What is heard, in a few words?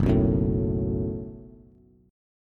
F sharp Minor Double Flat 5th F sharp, Bass; strummed